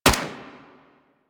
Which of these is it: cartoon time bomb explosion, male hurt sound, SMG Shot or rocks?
SMG Shot